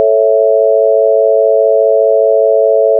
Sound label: A Major Chord